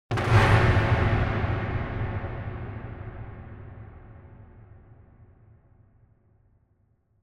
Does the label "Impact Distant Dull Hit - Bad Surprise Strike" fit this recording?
Yes